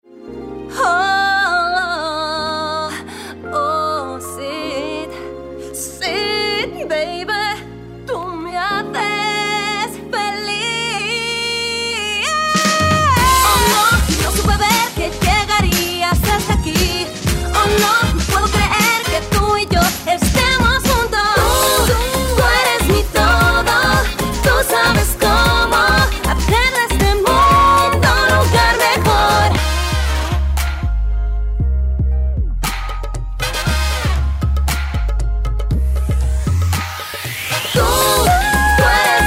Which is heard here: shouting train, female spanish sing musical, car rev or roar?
female spanish sing musical